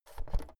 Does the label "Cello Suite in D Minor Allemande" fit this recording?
No